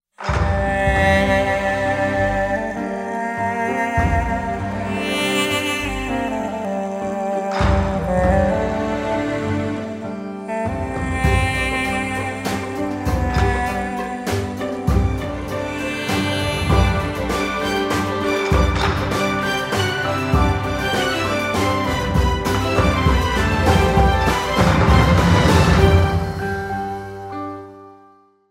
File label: theme music